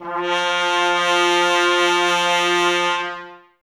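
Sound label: BRS trumpets F; 90 s Sample CDs; Miroslav Vitous; Woodwind Brass Ensemble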